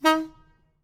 train horn

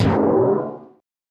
stupid

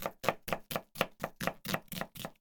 kitchen knife cut

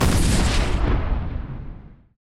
bomb, battle

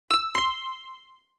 correct

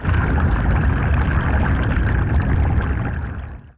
water flow

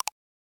decision, button

